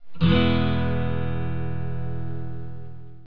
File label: e chord, guitar